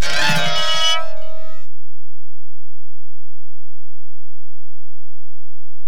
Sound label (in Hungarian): vonós magas